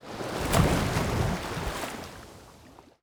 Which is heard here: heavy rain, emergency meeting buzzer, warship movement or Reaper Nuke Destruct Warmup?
warship movement